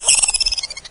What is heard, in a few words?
tick pain; doom3